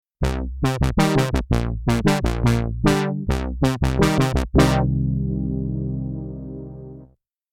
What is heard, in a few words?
Brassy Poly800